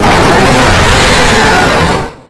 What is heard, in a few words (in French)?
Cri Parfaite; 2,3 s; cris 7G; SL